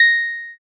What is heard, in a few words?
ding